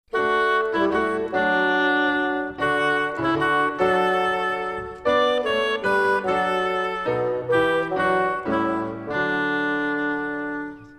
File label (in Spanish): musica